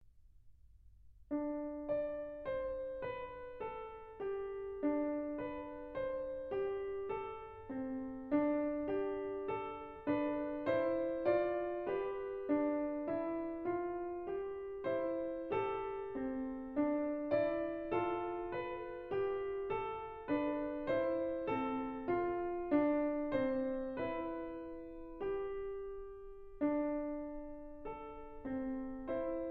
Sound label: In Dorian Mode, Piano; Book 1; Mikrokosmos, Sz. 107; Bartók; Complete Solo Piano Works